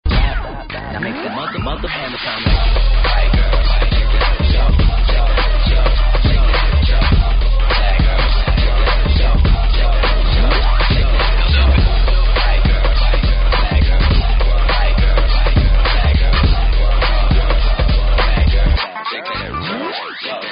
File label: funk; multitrax